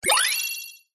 science fiction cyberpunk equipment activate ascending beep; zapsplat